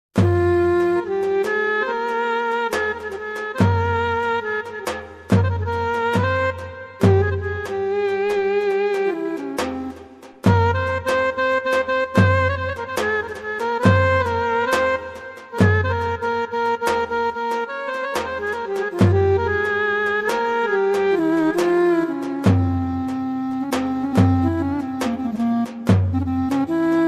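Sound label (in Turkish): harmandalı, Korg PA55 TR; orjinal demolari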